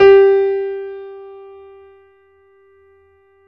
PIANO FF G4

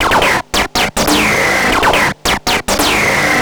Treat Guit, Industrial Nasty

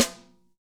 snare dry; Real Mega Drums

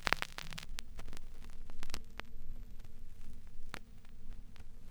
Crackle, Vinyl Noise; noise hiss crackle

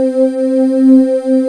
PAD nice Pad; Best Service Gigapack 1

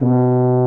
B2 TUBA

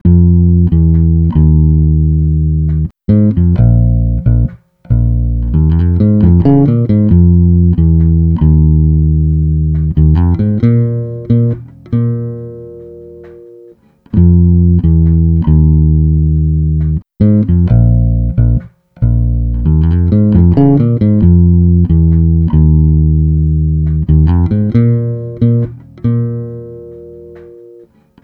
Bass, Electro Lounge; Sonic Foundry (Sony Creative Software)

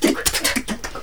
NOISE, CLASSIC VOX; Best Service; Hallelujah

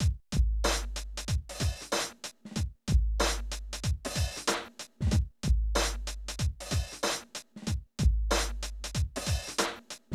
Drum loops, Hip-hop; Zero-G Groove Construction (1993)

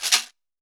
cabasa; Brazil

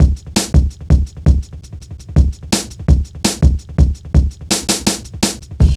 Funki Tuff, Jungle, Drum Loops 166.5; Zero-G Jungle Warfare 1, 2 & 3

